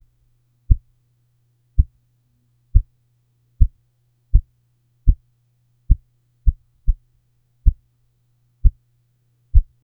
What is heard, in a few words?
soft thud sound effect